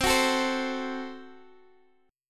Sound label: F sharp Diminished C